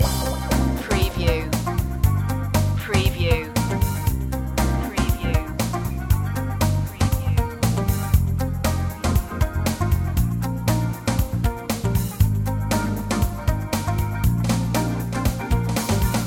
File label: city blues loop